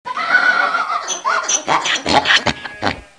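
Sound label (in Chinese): zhu jiaosheng, pig grunting; jiachu jiaosheng; jiaqing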